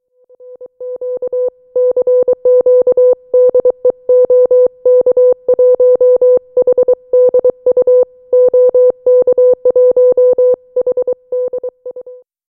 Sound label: cq ok1hdu, TinyBug